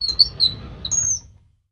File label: Drawer Metal Open Qu; drawers